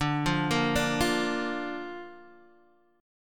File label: D Minor Sharp 5th D down Guitar Open D